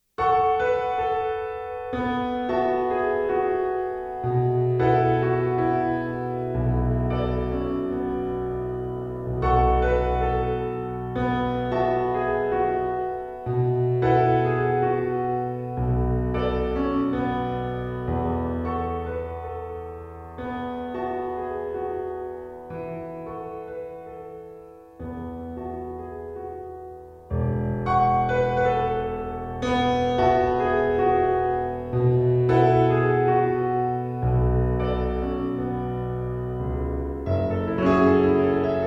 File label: piano pop